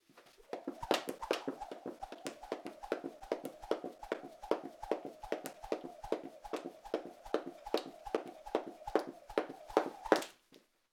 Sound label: Skipping Rope